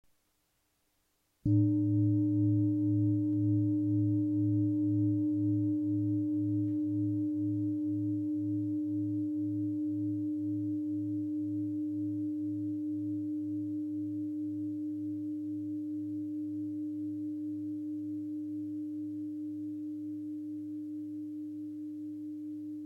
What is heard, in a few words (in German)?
Tibetische Klangschale; Frequenzanalyse; 1520g